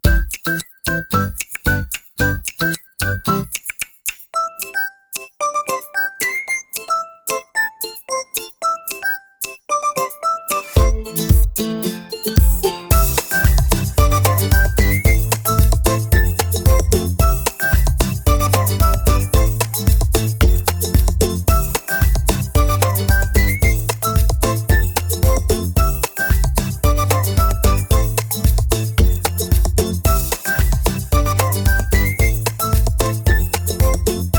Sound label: dopamine ukulele